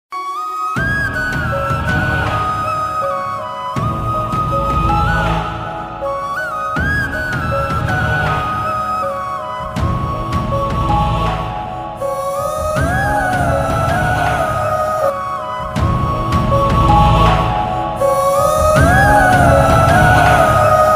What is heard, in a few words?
sad ringtone; ruposh telefilms